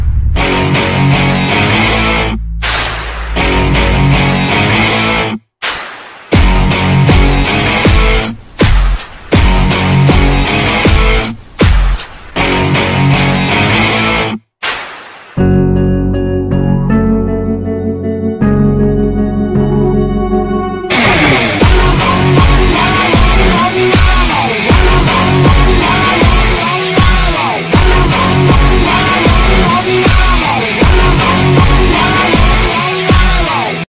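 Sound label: background music Ringtone; Kabir Singh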